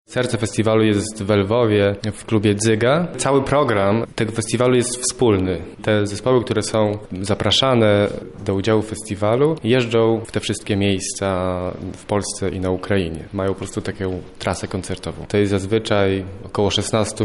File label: jazz